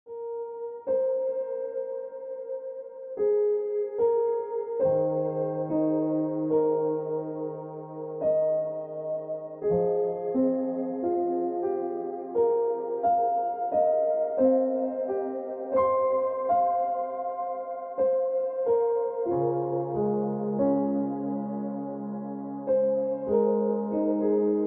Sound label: Keep It Colorful Ringtone Piano Music